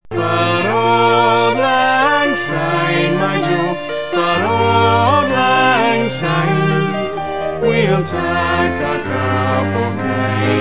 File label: auld lang syne